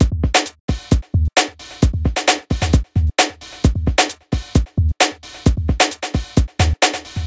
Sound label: 132 drum loops; TongueTwister; M8; Electronisounds